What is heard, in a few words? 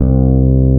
BASS, Drum & Bass, 150 BPM